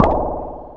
acid synth (blurp); Sonic Foundry (Sony Creative Software); Ugly Remnants VOL-2

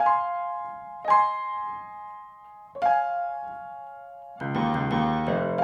Piano, 70s Analog Funk; Sonic Foundry (Sony Creative Software); What it Is! 70s Analog Funk

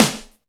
SNR RAGGA, Snares; AMG; Now CD-ROM (Roland); NOW! Drums

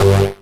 Synth Hit G

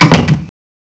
DOOR; CMI Sound Library Rev 1.3

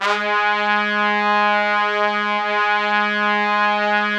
G#2, Orchestral Brass; Best Service ProSamples vol.20; AKAI

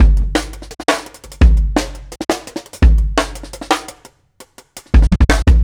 Marching Kit 4 Bar, Drum n Bass Beats; Beats and Percussion; KJ Sawka; Drum n Bass & Beyond

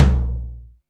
BIG O LO, TOMS; Back In Time Records; Big Bang

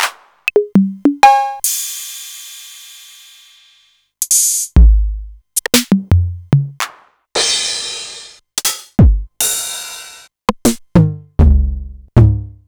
Novation Drumstation; DrumstationV1

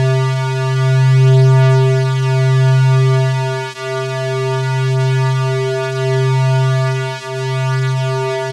SQUARE C3, SYNTH; AMG - Global Trance Mission VOL-2

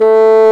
Orchestral Winds Bassoon; Roland LCDP04; WND Bassoons

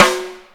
SNARE, Ragga & Dub Reggae; USB Soundscan vol.27; AKAI; SNARES KT

